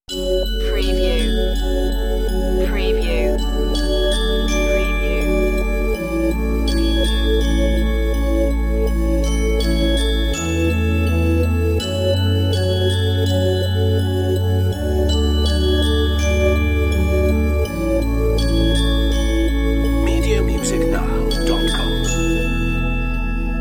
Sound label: true reflections bell lead